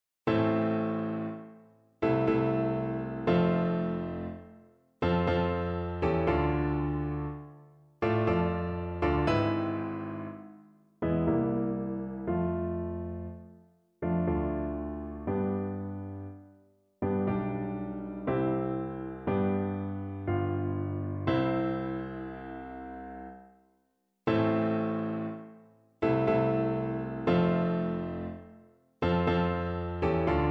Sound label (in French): Sonate en La mineur Gaillard clic, tuba